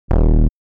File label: Error noise